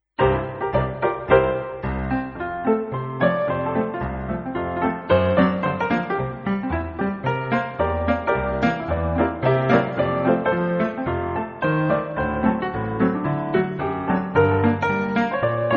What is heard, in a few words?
saute